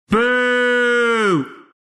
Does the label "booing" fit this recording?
Yes